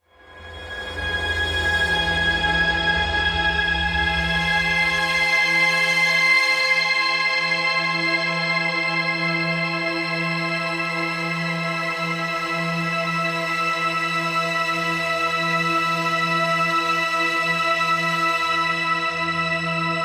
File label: Enter Space Music; Warframe; Railjack